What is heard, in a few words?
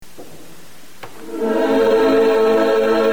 regina coeli